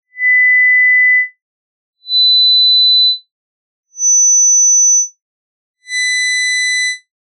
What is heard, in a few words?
steady